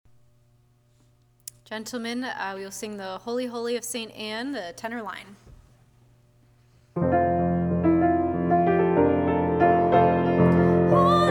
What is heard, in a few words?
Holy Holy men